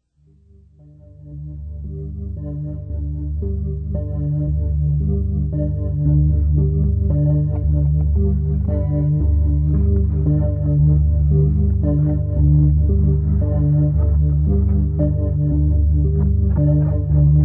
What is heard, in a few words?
score sample; Voices from the High School